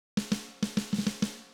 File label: Cumbia Fill